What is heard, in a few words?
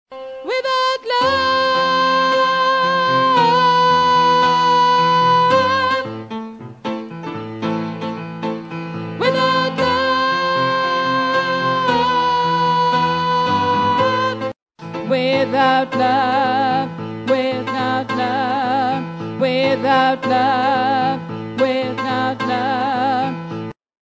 tenor